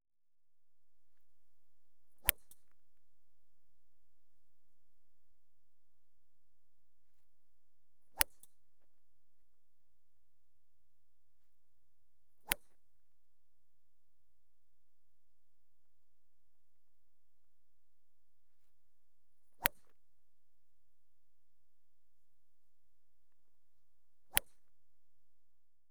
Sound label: Golf 5 Driving Shots; Sport Leisure; BBC Sound Effects Library